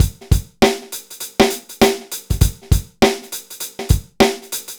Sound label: dry loop, BPM100